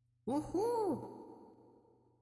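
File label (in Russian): крик человека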